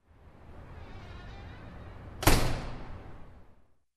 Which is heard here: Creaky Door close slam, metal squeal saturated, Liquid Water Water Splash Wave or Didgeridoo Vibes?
Creaky Door close slam